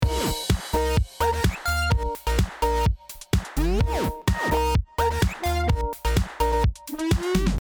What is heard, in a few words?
Teams ring remix